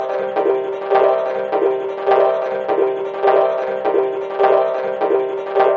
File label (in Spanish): la electronica